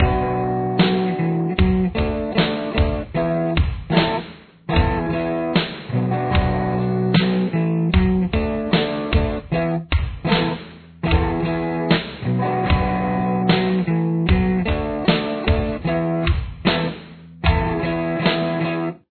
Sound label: rhythm slow